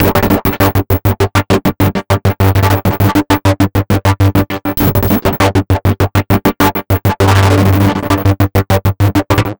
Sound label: Envelope Beat; Drums & Drones